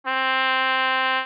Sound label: trumpet